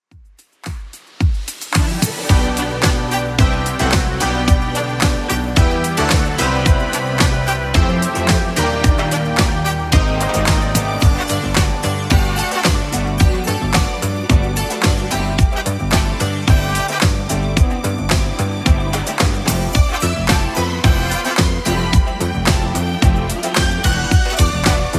80s Club 110 bpm CLEAN; remixplanet

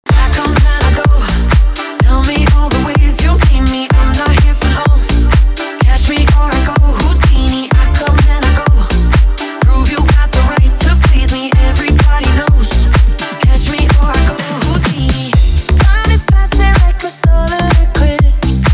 Club Party Banger

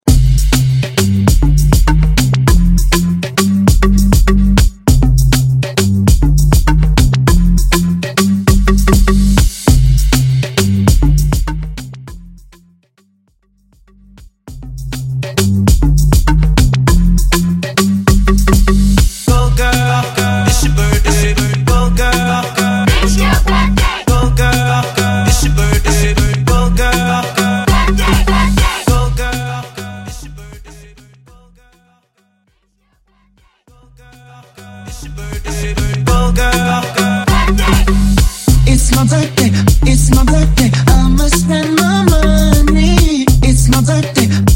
Moombahton; Mainstream VIP

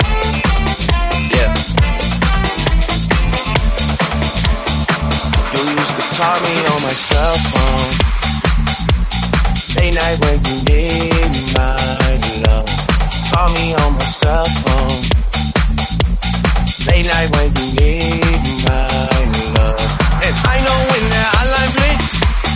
135 bpm Am

